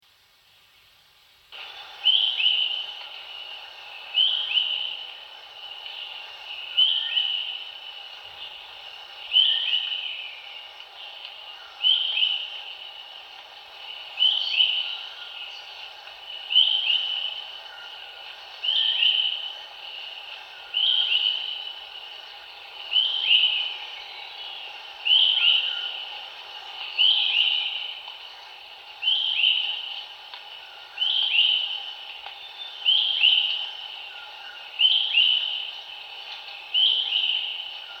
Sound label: hodgsons hawk cuckoo